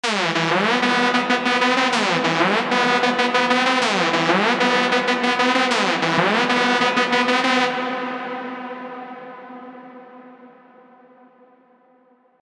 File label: Reverb - Synth Lead; Synth Lead 2; CRTIV Reverb; Thunder